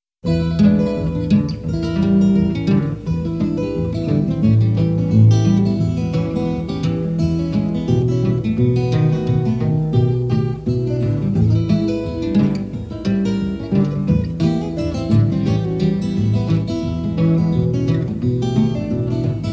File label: Deep River Blues